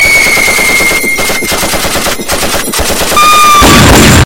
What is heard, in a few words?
Gun Shot Scream